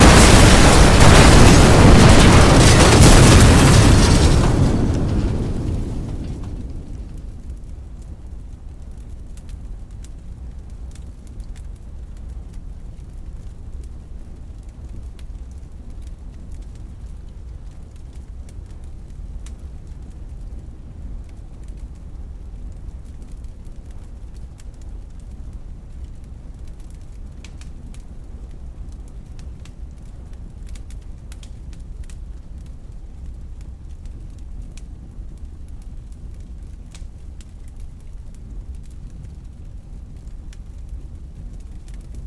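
double monstrous explosion with sounds of a campfire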